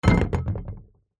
foley metal rod small hollow set down on plastic bin lid